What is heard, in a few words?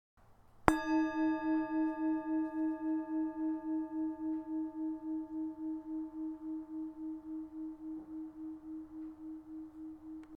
Singing Bowls